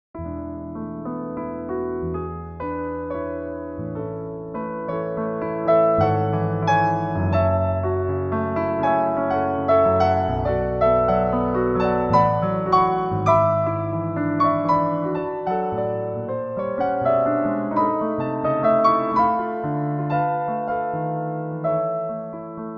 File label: Piano Concerto Excerpt; Scriabin; Op20 No3